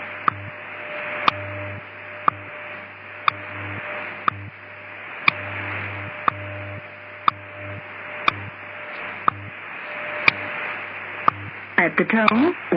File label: wwvh; wwv on 20 mhz